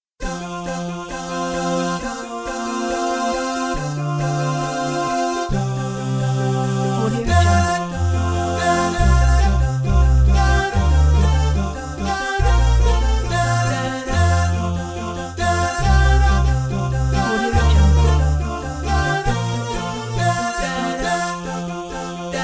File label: acapella